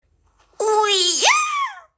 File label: wee yaaa